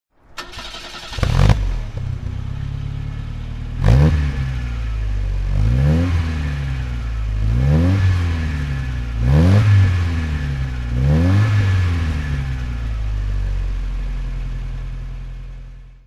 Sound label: ford prefect throttle